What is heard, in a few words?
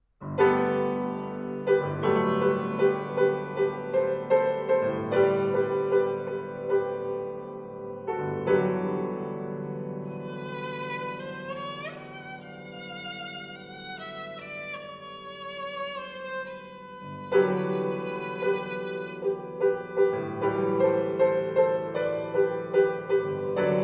Sonata For Violin and Piano, Maestoso. Spirituoso; No. 1; Op. 2471